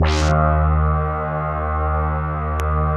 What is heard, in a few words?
OBERHM OBXA BURST EB; Big Fish Audio - First Call Vintage Keyboards